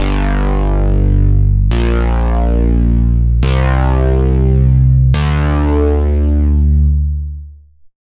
Bass; Routed Sound; Serum 2 Expansion - Reality Maker Vol.1

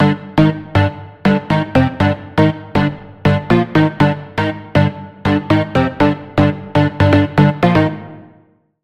Pluck, Sylenth1; Routed Sound; Sylenth1 Expansion - Noble Gangster Vol.1

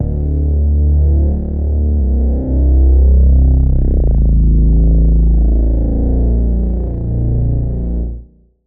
Reese; Routed Sound; Spire Expansion - Street Vanquisher Vol.1

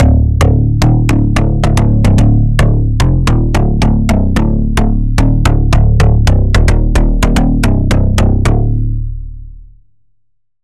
Bass; Dirty Bomb; Routed Sound; Serum 2 Expansion - Noble Gangster Vol.1

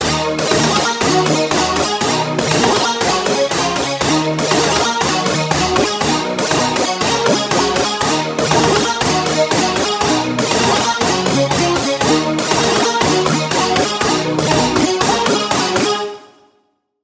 Key; Boosted Power; Routed Sound; Spire Expansion - Hyper Gamer Vol.1

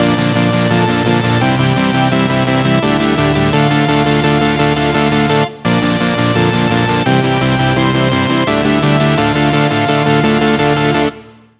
Chord; Awaken Being; Routed Sound; Serum 2 Expansion - Flame Summoner Vol.1

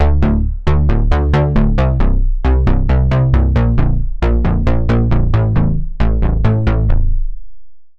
Bass, Sylenth1; Routed Sound; Sylenth1 Expansion - Reality Maker Vol.1

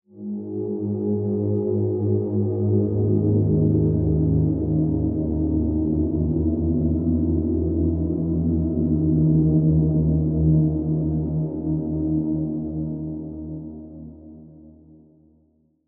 Pad; Routed Sound; Spire Expansion - Noble Gangster Vol.1

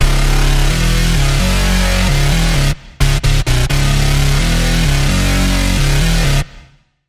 Bass; Routed Sound; Spire Expansion - Chaos Bringer Vol.1

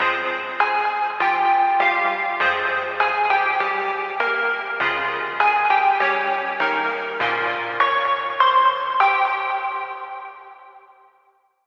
Pluck - Exile Fate; Routed Sound; Vital Expansion - Street Vanquisher Vol.1

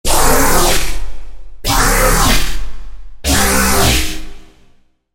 Growl; Lamination; Routed Sound; Vital Expansion - Chaos Bringer Vol.1

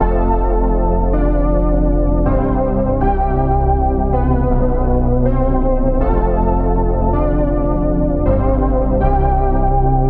Key, Spire; Hidden Law; Routed Sound; Spire Expansion; Street Vanquisher Vol.1

